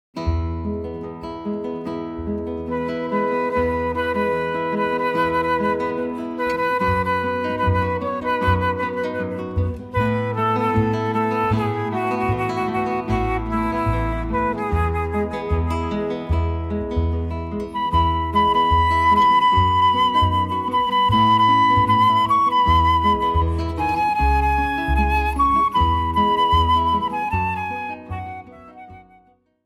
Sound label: guitar flute bass trio